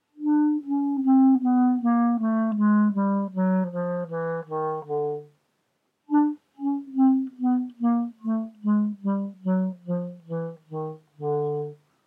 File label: chromatic scale down with tongue and without tenuto